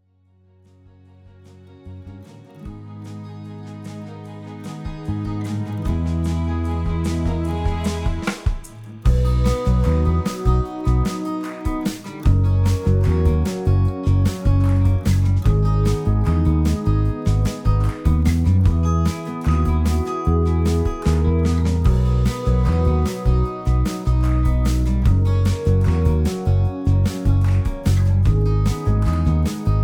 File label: chorus bridge minus